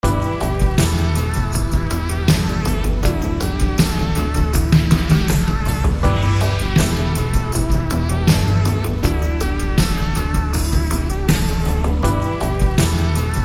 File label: 80s Loop; 8d sample pack genres